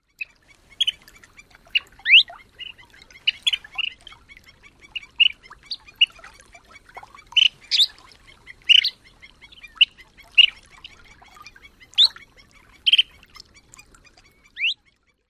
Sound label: chirps & other calls